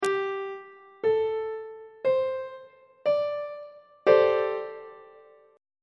d dominant 7th suspended 4th 1st inversion on treble clef